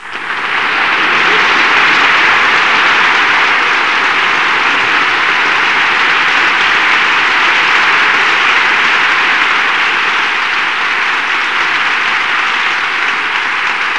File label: applause